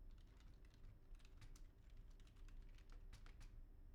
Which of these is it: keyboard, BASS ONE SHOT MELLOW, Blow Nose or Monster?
keyboard